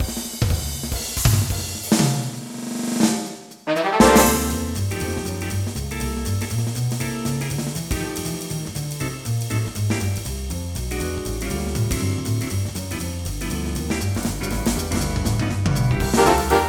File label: Swing Demo